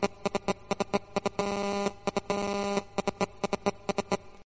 mobile signal beep caller tone

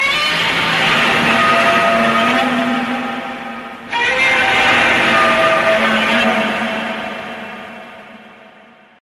roar sound effect sms tone; godzilla

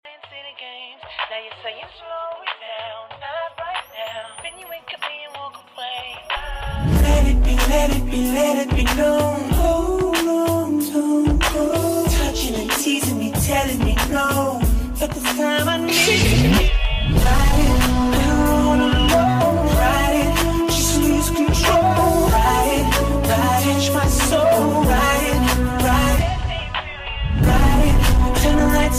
ringtone, english

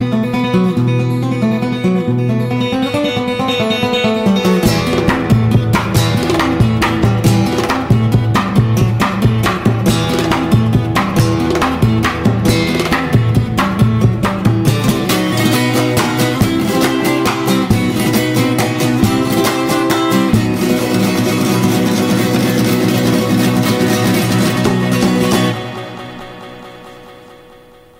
guitar ringtone

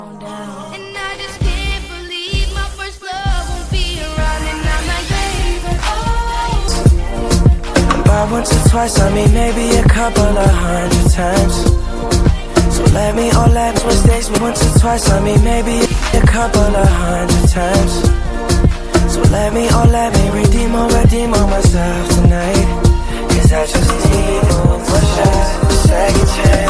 new remix ringtone, english

